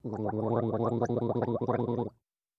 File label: Gargling